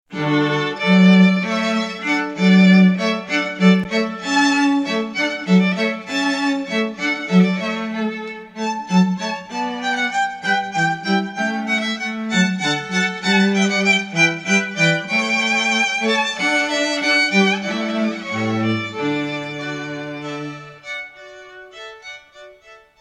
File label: hornpipe